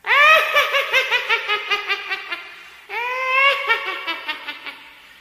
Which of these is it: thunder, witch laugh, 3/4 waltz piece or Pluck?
witch laugh